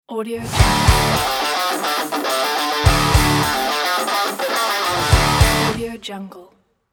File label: hard rock logo